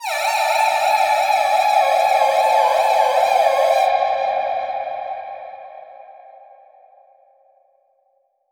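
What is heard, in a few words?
Echo Processed, alarm siren samples 125bpm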